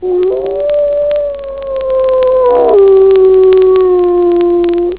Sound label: animal